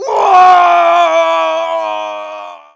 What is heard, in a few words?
waaaooow; foreman spike; SM64 CO-OP